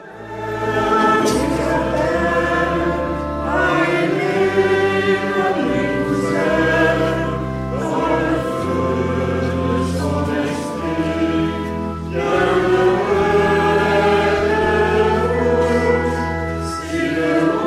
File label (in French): chant entree